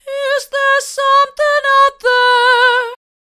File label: Opera Reverb, Female Vocals, Dry; Virtual Room Reveb; Syntheway